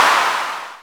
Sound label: Claptrap, ANALOG; Roland MV8800; ANALOG CRAZE